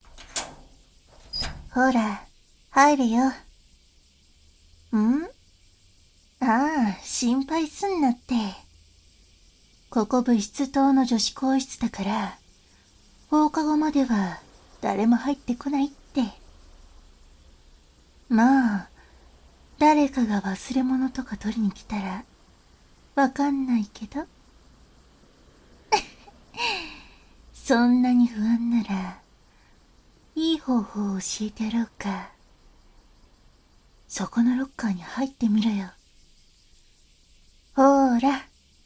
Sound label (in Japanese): 乳首攻めフェラ（環境音あり）; 休み時間の更衣室で乳首攻めフェラ（環境音あり） 左